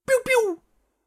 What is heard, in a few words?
laser guns